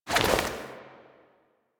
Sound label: Generic Air Roll Whoosh, Foley; Characters; Warframe